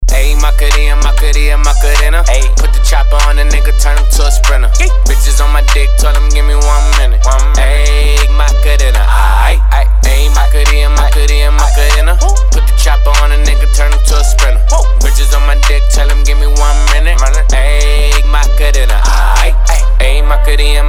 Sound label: 40-50Hz 3db